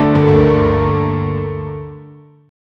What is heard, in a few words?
piano cinematic ending, one note